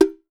LOW DARK, COWBELLS; Voice Crystal - Steve Reid Definitive Percussion

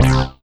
Synth Hit C

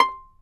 fiddle pizz C6 R; Sampleheads; Whole Lotta Country 1 & 2

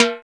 POP TIMB HI, TIMBALES

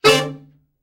Killer Horns, SECTION 7TH; Best Service

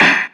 Heavy Snare; Zero-G Sound Sense Grime Scene; GS Kits

